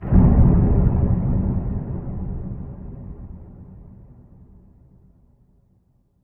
Impact cinematic muted distant dark bass boom with staggered attack